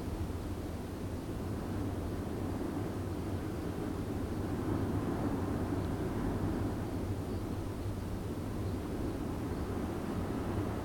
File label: entrance, snow